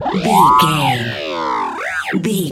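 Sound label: sci-fi droid robot emote neg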